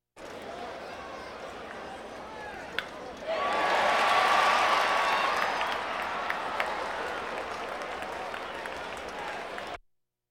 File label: Crowds Race cars Baseball